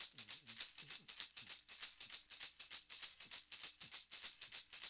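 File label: loop, tambourines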